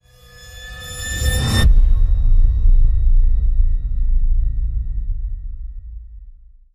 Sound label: Rise To Hit Excess Heat